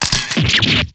damage

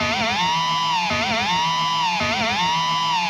up and down; metalman

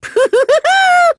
upupupu; monokuma

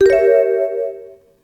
suspicious

